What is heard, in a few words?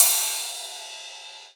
Rides, Cymbals